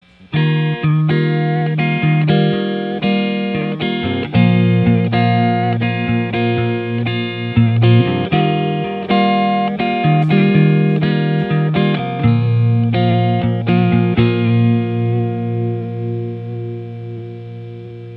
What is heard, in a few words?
square